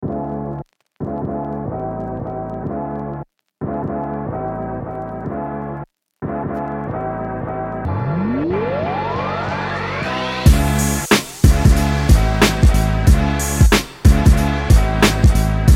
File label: positive boombap hip hop